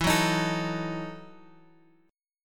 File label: E Major 7th Suspended 2nd Suspended 4th E, Guitar, Open G; chord shape